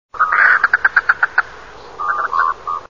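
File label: goosander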